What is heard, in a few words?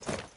Step Normal; endless world idle rpg gamerip 2019